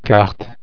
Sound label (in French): cartes